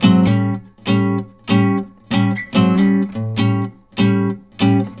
riffs, Blues Guitar; ILIO; Fretworks

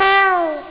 down trump; speedball2; Amiga Soundtracker Sample Packs

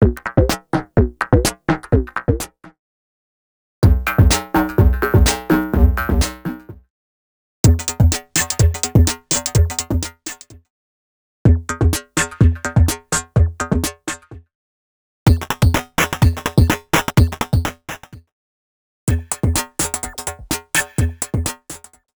Electro Pool 126bpm; X-Static Goldmine 5

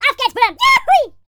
german, YODEL YELL; ILIO; Vocal Planet

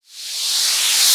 Reverse Cym, Cymbals

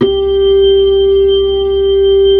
B-3, Hollow; Keyboards of The 60's and 70's

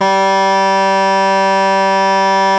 BASS SHAWM; SoundBytes - Early Patches

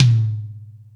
Toms, Drum Machines E-MU Pro-Cussion

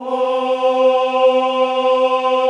CHO C3, CHO Ooh; Sound & Vision; Gigapack I CD 2

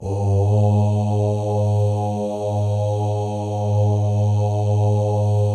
male ohs; Spectrasonics; Symphony of Voices

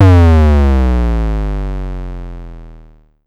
Pollard Syndrum Sub

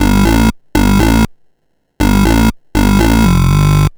Sh09 Bass Loop, 120BPM

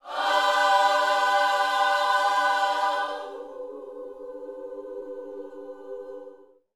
Vocal, Gospel, PHRASE; Spectrasonics

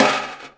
Prepared Drums

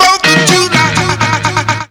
lop 126bpm, House Instr and Stab Loops

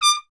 TP D; Miroslav Vitous; Solo Instruments VOL-1

